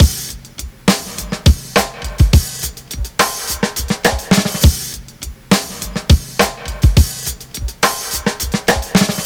104 bpm drum beat d key, Free drum loop sample - kick tuned to the D note. Loudest frequency: 2661Hz